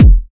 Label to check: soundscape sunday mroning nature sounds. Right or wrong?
Wrong